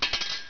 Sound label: sword